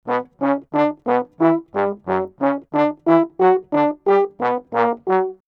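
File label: 90 B minor all, brass; 90 s Sample CDs; PowerFX - Downtown Orchestra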